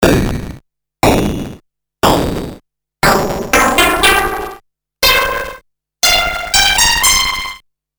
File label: noise hit